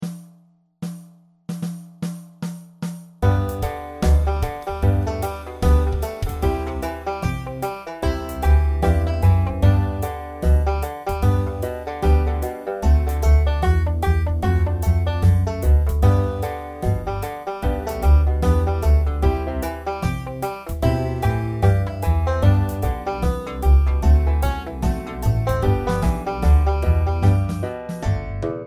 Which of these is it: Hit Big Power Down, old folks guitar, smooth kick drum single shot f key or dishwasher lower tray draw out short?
old folks guitar